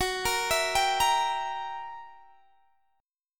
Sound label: Fsharp 7th Flat 9th down Guitar DADGAD